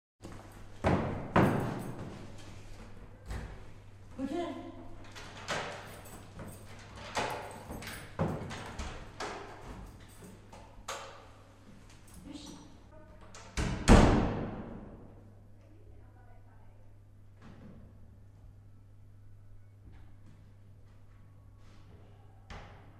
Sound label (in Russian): zvuk v podezde doma